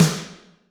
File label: Snr, Drum Machines, Alesis D4